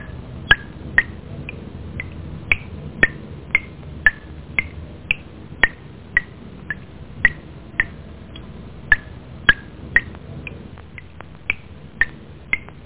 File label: WATER